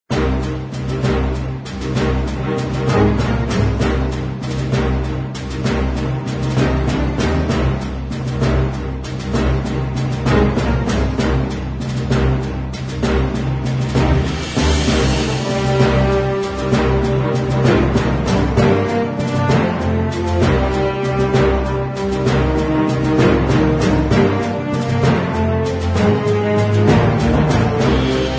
battle

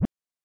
Jump

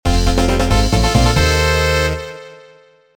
Bonus Fanfare; Clockwork Knight 2; saturn

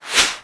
whossh; worldmap

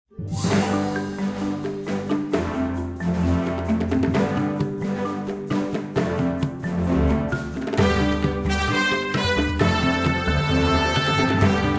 Titlescreen Intro Music; Temple Run Treasure Hunters; android

